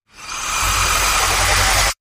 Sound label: Devil Breath Sweep